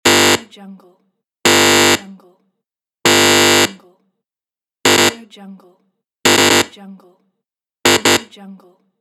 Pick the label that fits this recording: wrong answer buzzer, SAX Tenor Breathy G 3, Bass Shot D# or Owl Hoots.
wrong answer buzzer